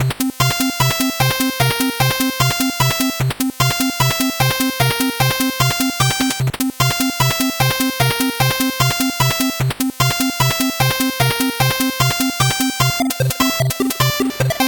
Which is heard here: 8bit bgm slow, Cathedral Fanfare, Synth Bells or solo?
8bit bgm slow